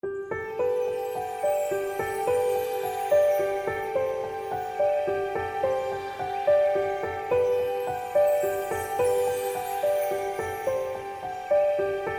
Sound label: Music BGM EndScene; Wenjia